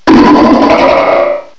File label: medicham mega cries; direct sound samples